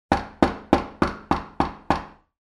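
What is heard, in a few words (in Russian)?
издевательский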